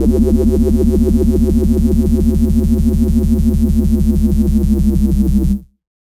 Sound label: D&B Bass Hit; neuro